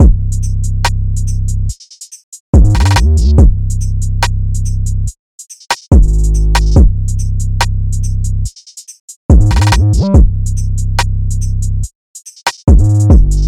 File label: 142 BPM Cm; Pyramids